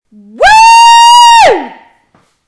wooooooooooo

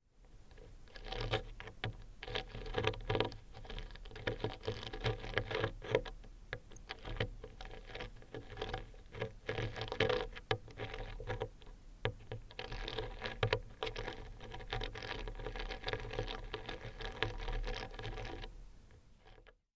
human tooth enamel and dentist s hand drill

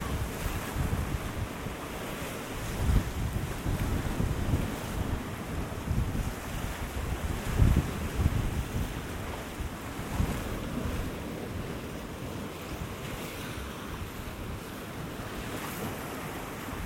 sea soundscape binaural waves

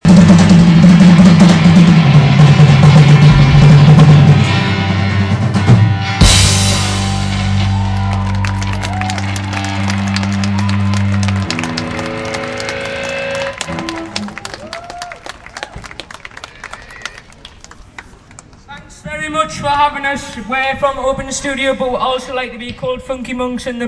open air rock concert; sage gateshead

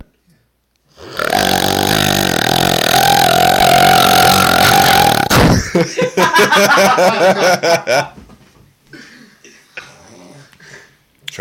pig snort

